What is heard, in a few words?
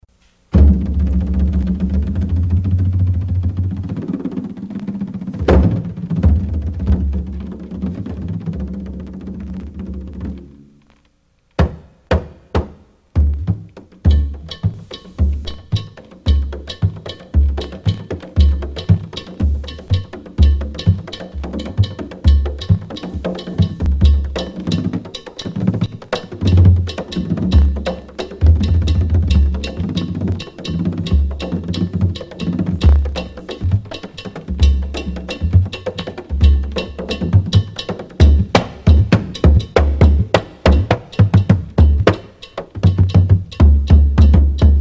drum